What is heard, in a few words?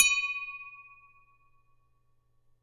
Glass